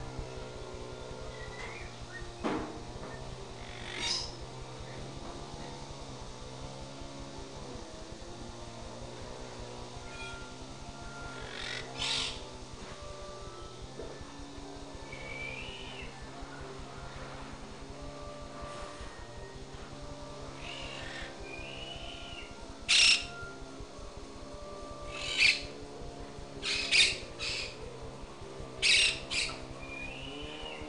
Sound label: bird; radio work